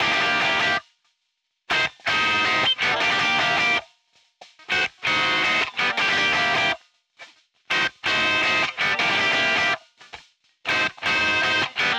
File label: stabby guitar (160 bpm)